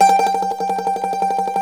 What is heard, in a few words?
MANDOLIN, Tremol Mandolin; StTremolMandolin